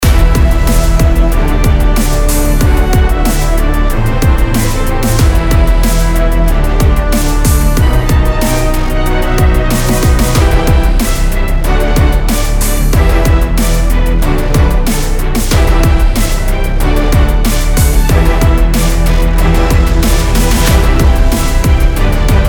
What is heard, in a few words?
Ringtone Heroic; TankiOnline